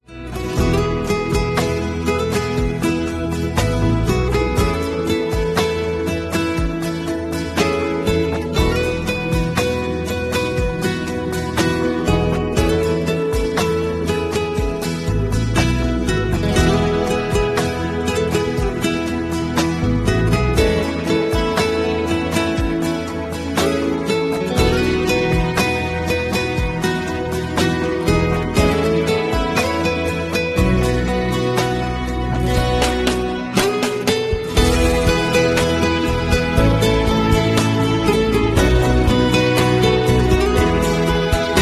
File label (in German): Perle Instrumental; Kawohl Verlag